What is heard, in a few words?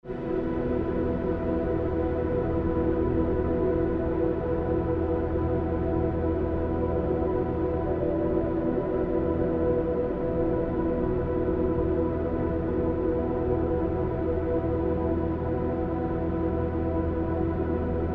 Airy, angelic atmosphere, Deep chords; Ambient SoundScapes